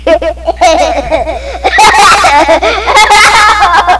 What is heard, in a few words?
Laugh, 8 years old